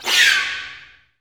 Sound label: STR SEC, STRING GLISS; Roland - LA Composer vol. 1